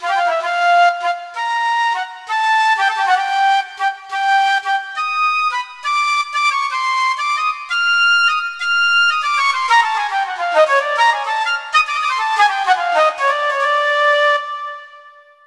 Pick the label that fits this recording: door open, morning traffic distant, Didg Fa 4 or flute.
flute